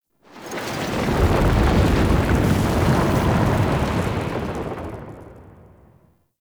Grineer Prime Dropship Explode Tail; Gameplay; Warframe; Tau

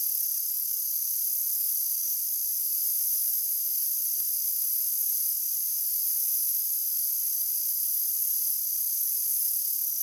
Insects High Loop; Ambience; Props; Warframe; Eidolon Plains

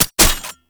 Bayonet Mech; Warframe; Tenno

